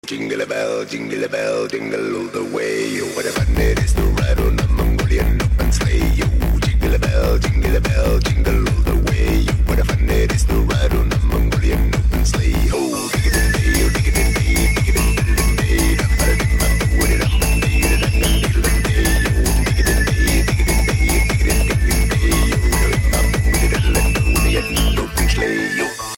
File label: Jingle Bells hits